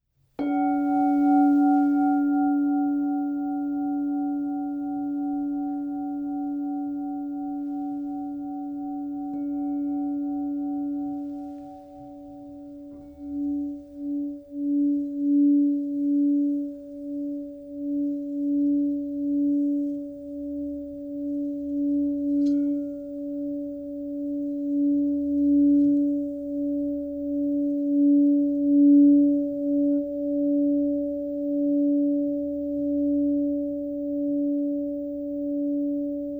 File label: D Chalice 7 Inch